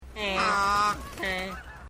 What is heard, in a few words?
geese squawking; the wild